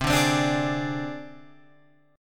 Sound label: C Suspended 2nd Sharp 5th C down Guitar Open F; Csus2#5 strummed; JGuitar